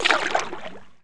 swim right; The Elder Scrolls III - Morrowind

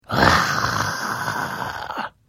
Hit, Vo

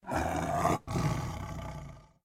Tiger growl; SyrinScape

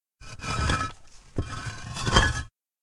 Medium Rumble Walls

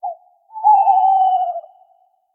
Tawny Owl; SyrinScape

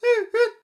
bird; Syrinscape unplugged; Jungleplanet dinolaser battle

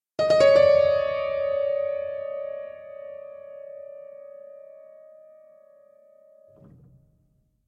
piano notes; SyrinScape; Madness in the library

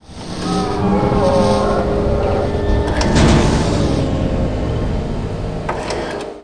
Fab Machine; Sotha; The Elder Scrolls III - Morrowind; Morrowind GOTY GOG

